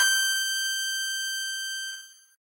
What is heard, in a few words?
piano; pianojs